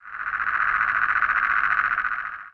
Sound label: antlion hill ambient, coast; hl2